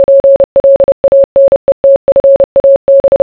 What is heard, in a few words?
morse; planetfab